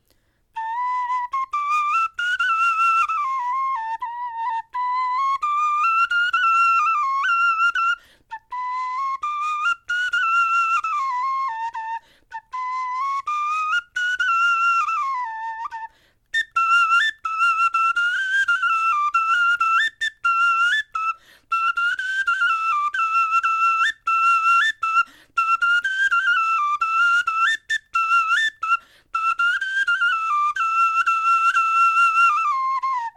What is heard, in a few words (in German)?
melodie